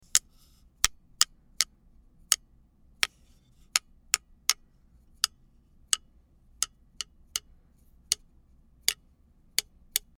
wood hit

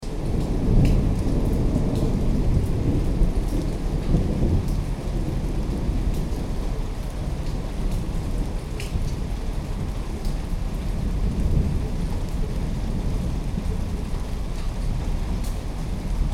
thunder

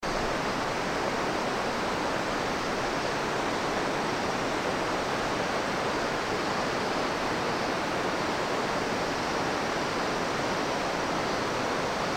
stepped rice paddy

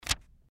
paper

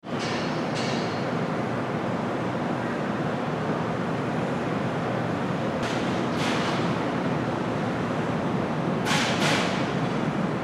construction site

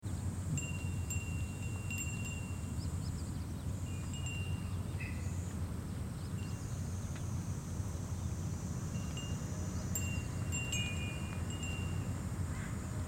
room ambient

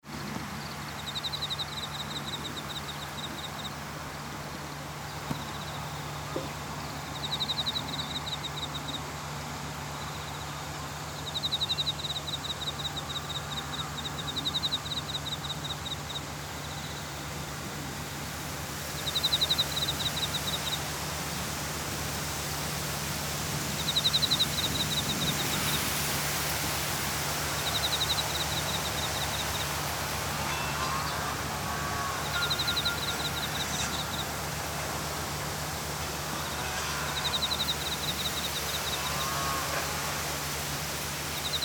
pastoral landscape

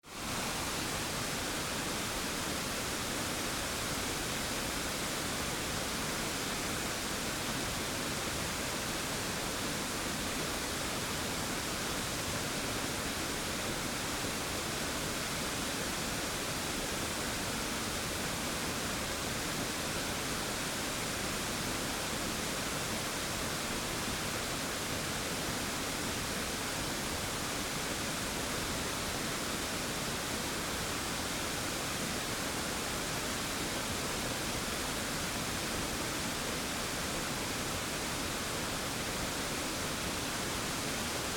Itoshima Shiraito no Taki

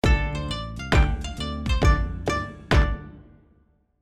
JINGLE Japan

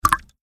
water drop

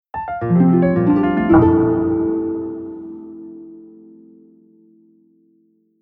logo piano

